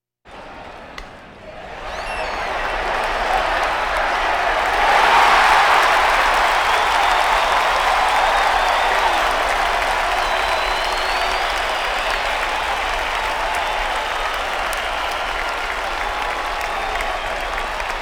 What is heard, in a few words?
Crowds Race cars Baseball